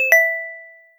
a short clean modern soun, positive

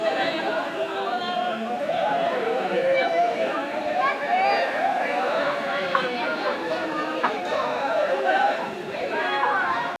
night club ambient sound

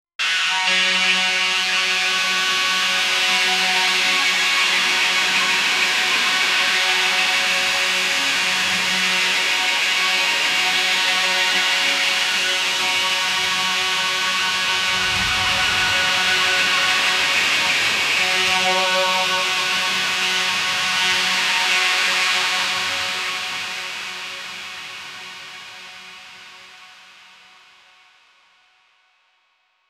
goa psy full on, psychedelic

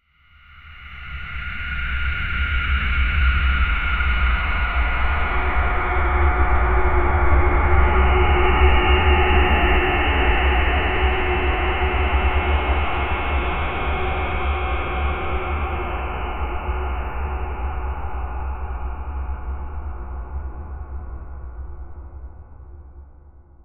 deep monstrous growl risi; fear

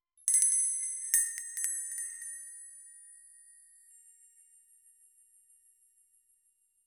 magical sparkle sound

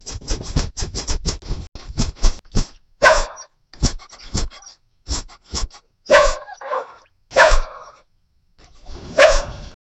quick sniff sound playful; bark

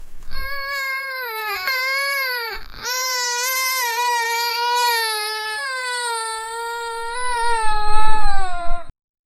crying kid girl being hur

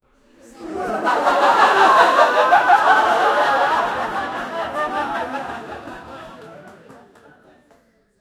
people lauging loudly

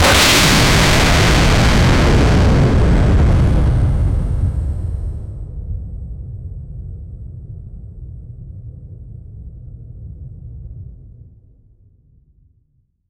futuristic energy weapon, futuristic

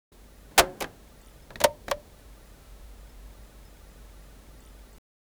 tap recorder cassette

